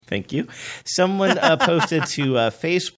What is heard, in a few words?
laughing thank you